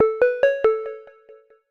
Controller Disconnected; tallowmere gamerip 2014

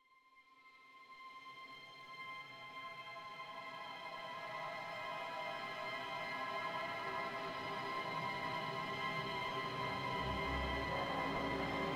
Riser; N.O.V.A. 3 Near Orbit Vanguard Alliance

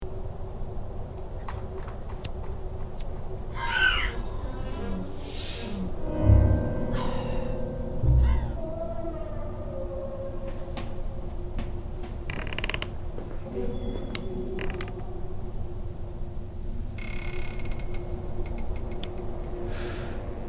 music Hotel Room; Essex

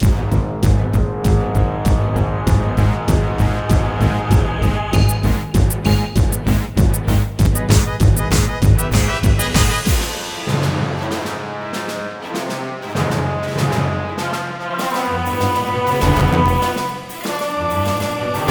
Boss Generic Loop; Plants vs. Zombies Battle for Neighborville

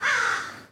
crows

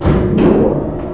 elevator start

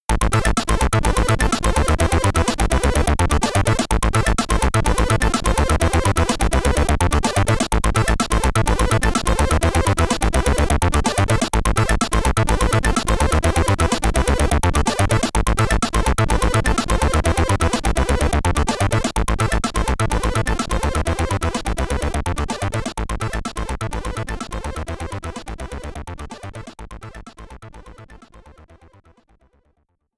Bonus SID Stereo; Pit-Fighter; Commodore 64

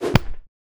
Equip Melee

